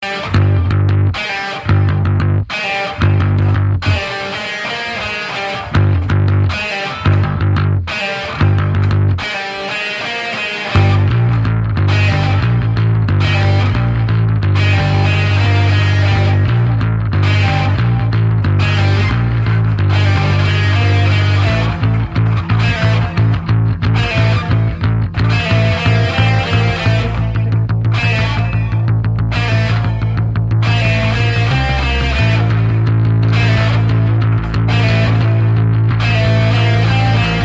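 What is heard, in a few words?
Bass & Lead Guitar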